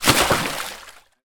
nature water splash

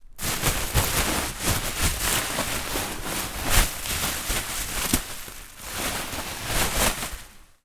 office bubble wrap roll large movement

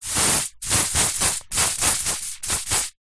household aerosol can spray several short bursts; zapsplat

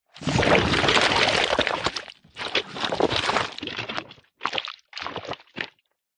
cartoon slime big movement squelch; zapsplat

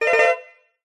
multimedia game sound short fast stuttering tone

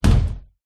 household door sliding patio bang shut

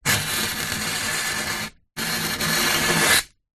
polystyrene strip thin rub scrape wood

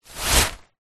household umbrella closed movement hand

